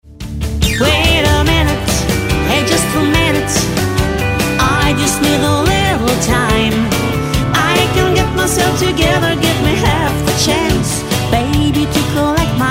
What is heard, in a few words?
country parlor